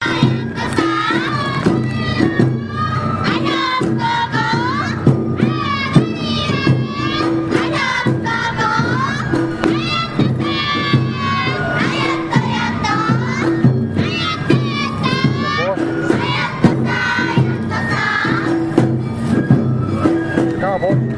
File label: Japanese Summer Festival